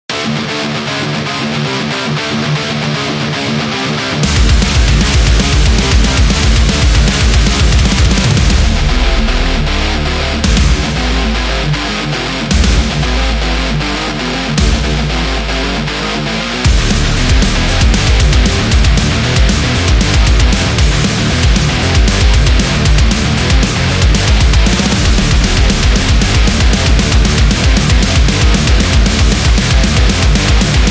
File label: Thrash Metal